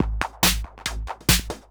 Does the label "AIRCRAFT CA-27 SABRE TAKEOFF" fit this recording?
No